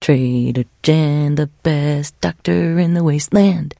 trader jen singing, 七日杀; 商人杰恩（七日杀）

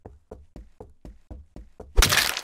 Walking on wood; mira hq map

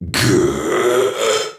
Dark Knight hit

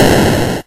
8bit hurt

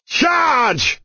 Barrack Move

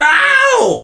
hurt vo; Surge; 瑟奇

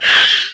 ant bite

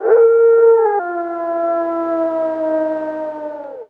dog howl; RA3

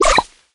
reload

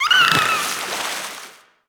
Dolphin die

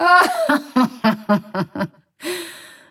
laughs

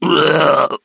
hurt vo; clancy

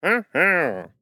Minecraft wandering trader accept

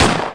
gun

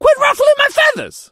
crow hurt

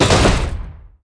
Catapult attack

